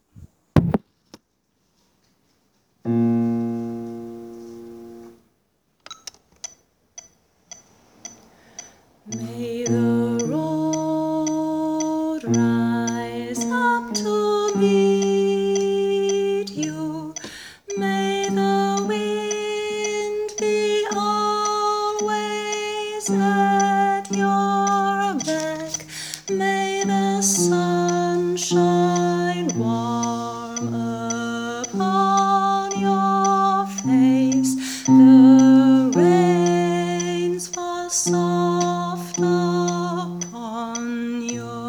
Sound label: May the road Bass